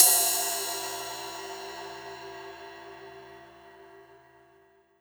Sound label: Ride, Cymbals